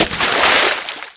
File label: h2o hit; quake sw